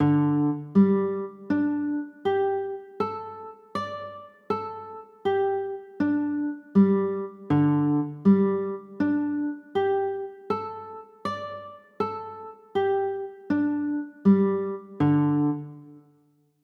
d sus4, chords

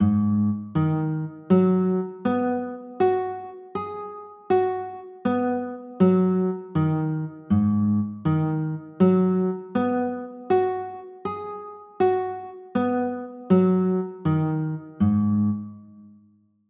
a flat m7, chords

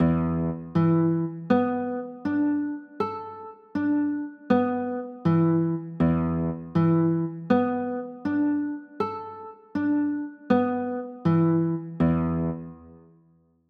e sus4, chords; e 7sus4

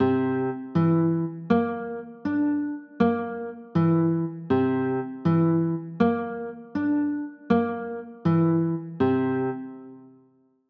c 6, chords

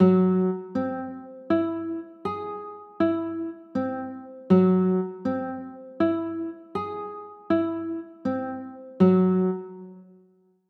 a flat 7 sharp 5, chords

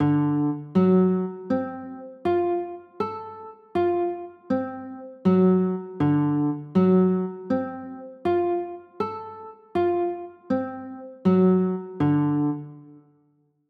d 7 sharp 9, chords

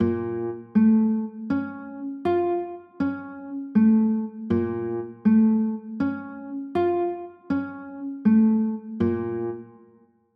b flat m maj7, chords

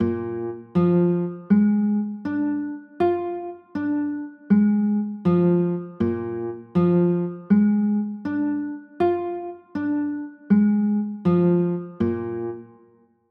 b flat 9, chords

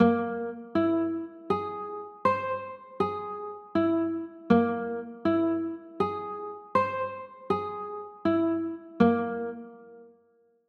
b flat 9b5, chords

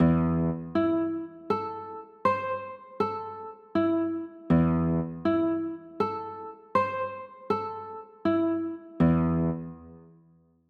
a m, chords

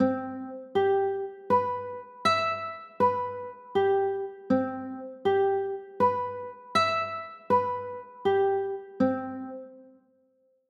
c maj7

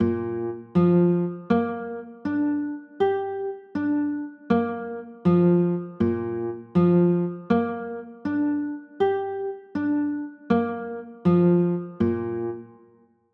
b flat 9, chords

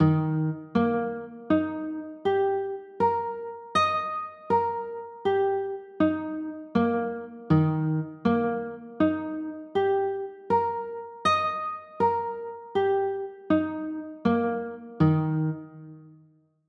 e flat maj, chords

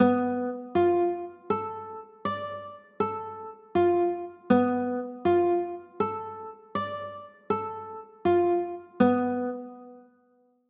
b m7b5, chords

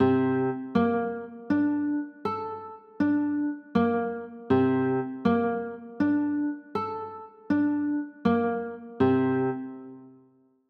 c 6, chords

